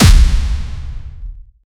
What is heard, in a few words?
transitions